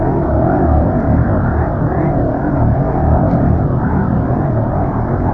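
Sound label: laundry machine ambience; hl2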